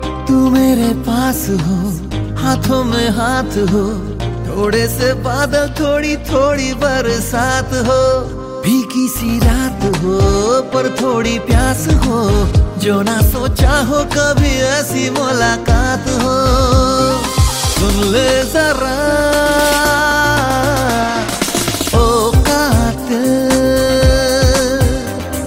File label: ringtone, bollywood